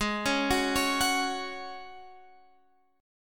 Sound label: G sharp 7th Suspended 4th; arpeggiated; x,11,11,11,14,14; G#7sus4